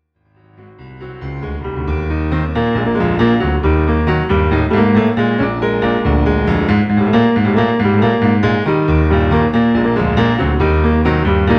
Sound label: Goin Home Blues